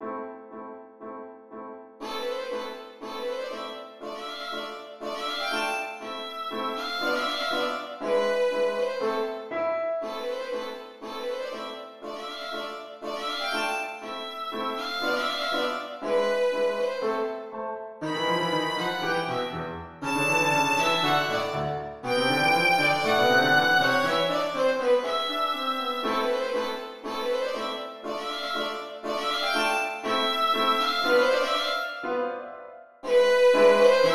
catch the villian violin

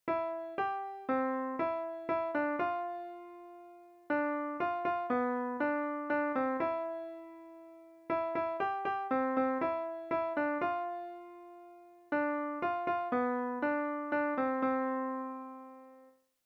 mary ann

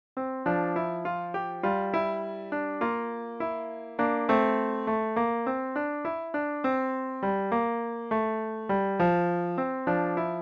piano solo

